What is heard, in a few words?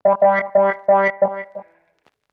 SYNTH 90 A; old rec